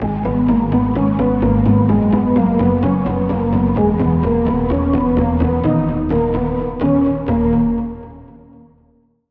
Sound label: Key; Telemetry; Routed Sound; Vital Expansion - Star Scavenger Vol.1